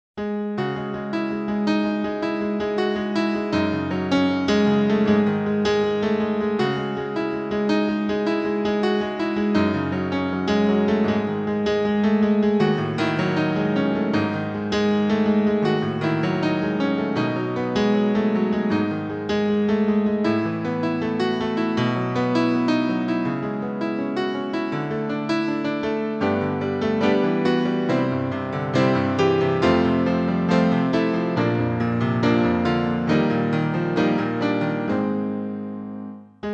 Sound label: C-sharp Minor